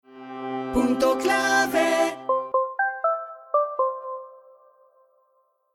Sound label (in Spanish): audiologo; puntoclave